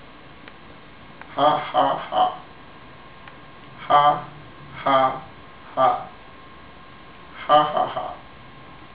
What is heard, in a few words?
ha ha ha